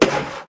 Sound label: UI Hammer Saw; BTD6